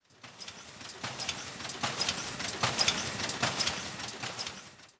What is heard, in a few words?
CLEAN; zones; industrial